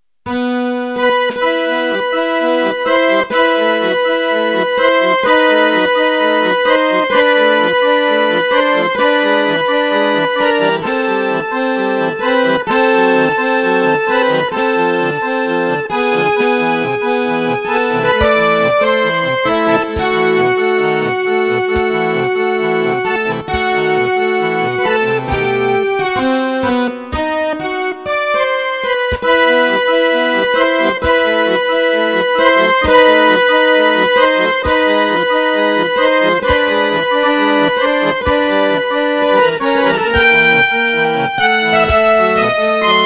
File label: Tango